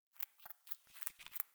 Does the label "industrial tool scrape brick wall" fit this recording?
No